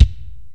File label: BD, Dance Mega Drums, HIP